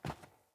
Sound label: Boot Walk Default, Footsteps; Characters; Enemy; Boots; Sinecure; Melee Grunt